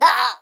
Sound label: Pecheneg Rogue Damage; Folk Hero